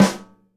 SNARE RIM, Live Snares

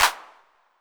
808 Clp, Roland MV-8008; Roland MV8800

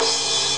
the cymbal; ST-XX Amiga Soundtracker Sample Packs

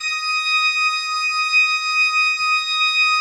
D5, Cathedral Organ; ILIO; Propeller Islands Cathedral Organ

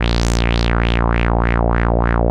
WAH WAH A#1; CMP - Analogon

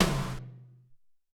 SR16 Tom, Alesis SR-18

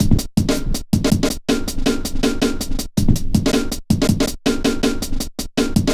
metallic stepz, drum loops

